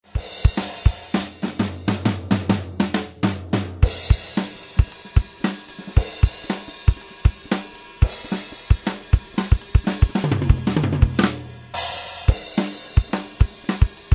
MIX batteria senza niente

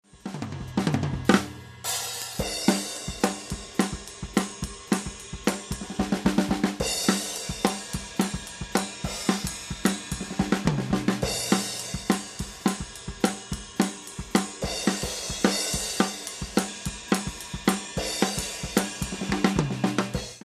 rit senza mic ride e con mic ride (solo OH), batteria

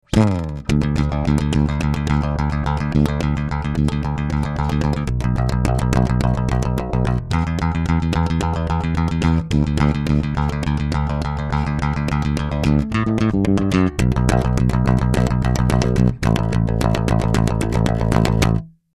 Basso DRY

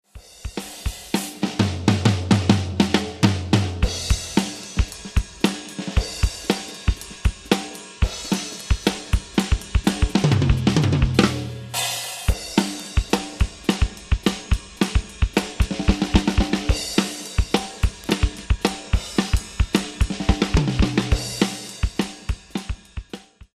MIX batteria senza valve mixer

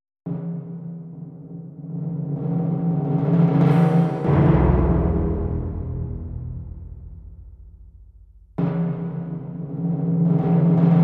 percussioni; Fantasia per percussioni

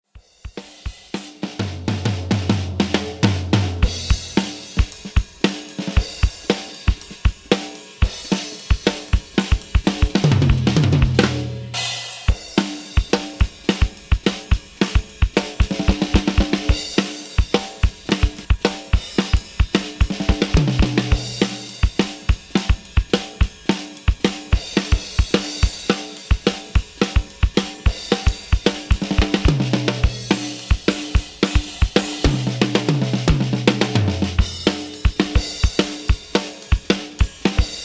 MIX batteria con valve mixer

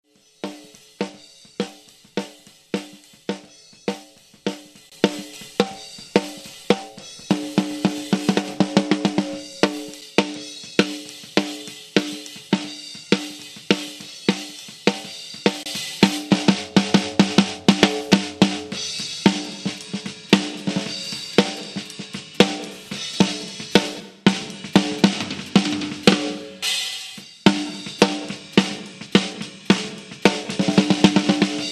Snare con FX; Batteria

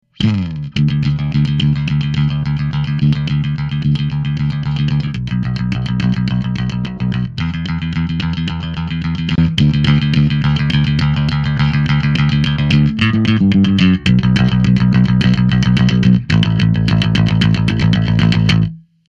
Basso Dynatube con FX

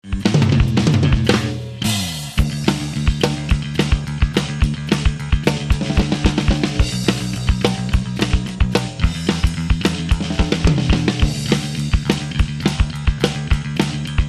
Mix basso batteria

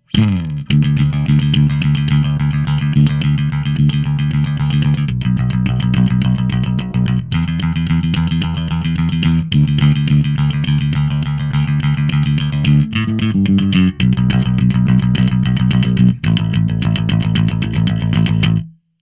Basso Dynatube no FX

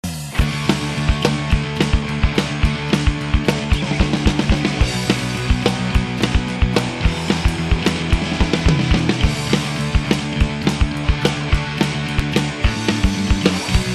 MIX con chitarre

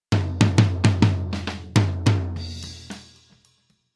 TOM con gate; Batteria

